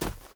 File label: Footsteps Grass Run; Contrast; we happy few